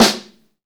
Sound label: snare, Roland